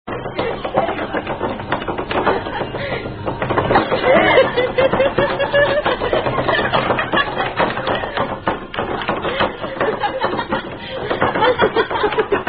Signs Indian Drinking Game; Thumper

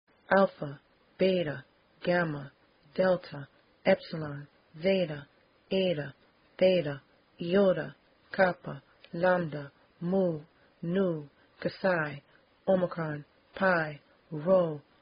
The Greek Alphabet Song

